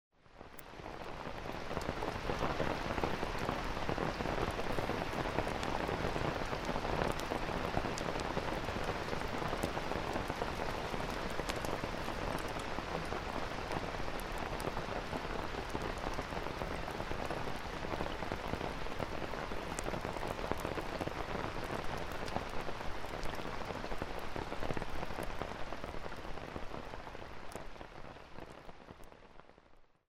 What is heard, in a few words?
on a tent